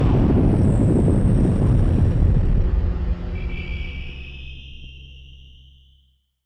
spacecraft landing; Sonniss GDC

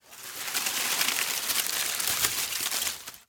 leaves pile pick up; fvtt sonniss gdc audio

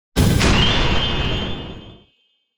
ranged magic instant; ranged magic generic

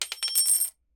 guitar pick metallic drop; sonniss gdc

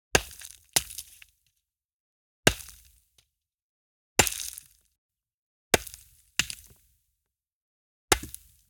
multiple rock hit dirt; Sonniss GDC

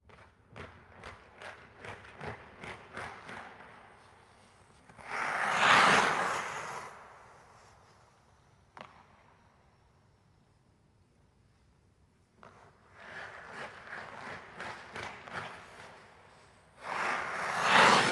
ice hockey skate turn in and turn wide mono close; boom follow cmc641; fvtt sonniss gdc audio

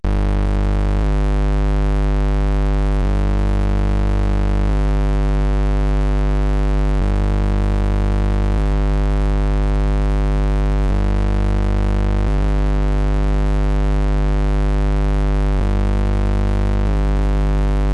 toy synth dirty deep lfo bass modulating wildly; major morgan; Sonniss GDC

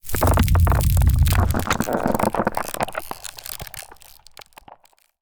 entangle intro, vines; 1st level spells; psfx

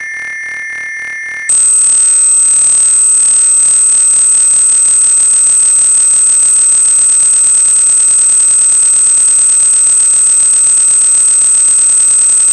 electrical interference high frequency crackle; sonniss gdc

